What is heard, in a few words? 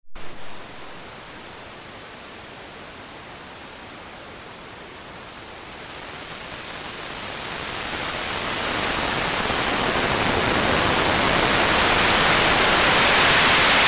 noise; pollen